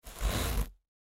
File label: Carpet Cut Razor, Carpet Cut Razor Knife Blade; Factory; Industry; Factories; Blastwave